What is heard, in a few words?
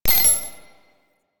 Flying Robot Beep; Warframe; Quests; Veilbreaker; Ambience; Kahl Quest